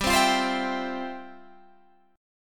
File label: G 13th Suspended 4th G, chord; G13sus strummed